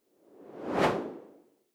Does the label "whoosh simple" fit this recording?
Yes